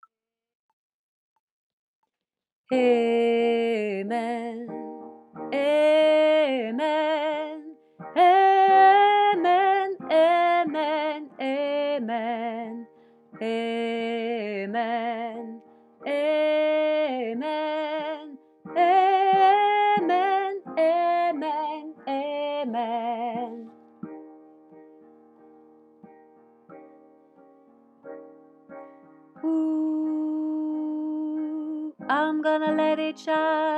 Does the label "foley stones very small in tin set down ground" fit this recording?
No